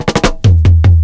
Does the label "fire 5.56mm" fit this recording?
No